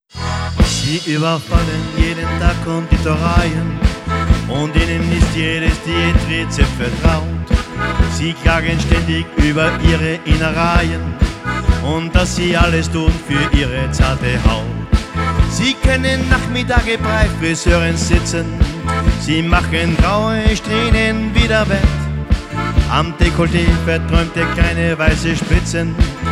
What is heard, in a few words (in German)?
Feinen Damen Tango